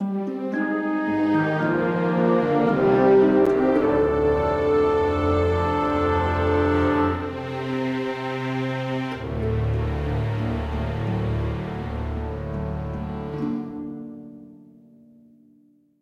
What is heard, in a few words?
Success Sting; Jurassic Park The Game